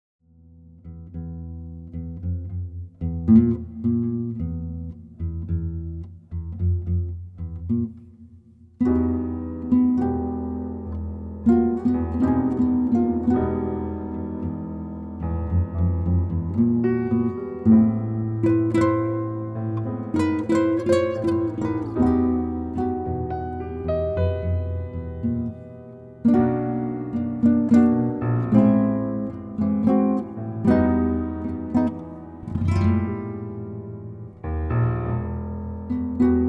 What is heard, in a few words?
Blues